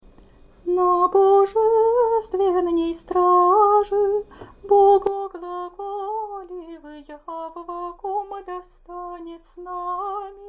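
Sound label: easter canon byzantine hirmos faster, singing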